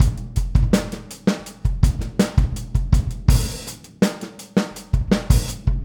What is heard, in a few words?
Boogaloo, Full Mix, 82 BPM; Drums; Verse; Sonic Foundry; Drums from the Big Room The Mixes